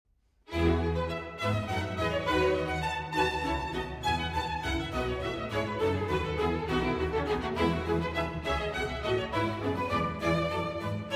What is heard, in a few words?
Violin Concerto in E Major; BWV 1042; J.S. Bach